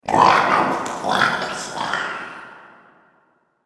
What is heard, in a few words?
Goblin Fiends Of The Dark, monster sound effect; fantasy sound effect; Andrew Fleming